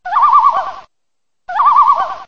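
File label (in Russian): звонкое отрывистое стрекотание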